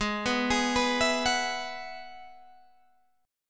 G sharp Minor 7th Sharp 5th G sharp, Guitar Open G